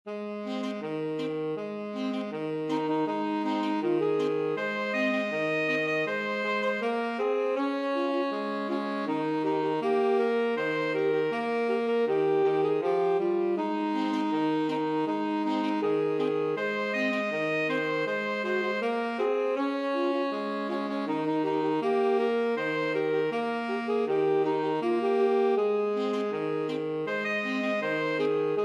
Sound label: wabash, 2 alto tenor sax